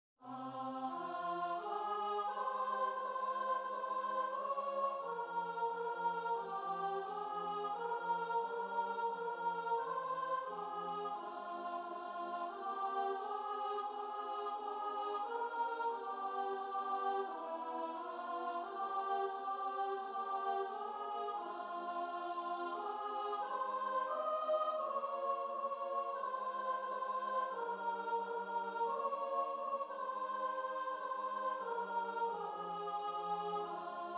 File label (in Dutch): ik wil alleen sops, choir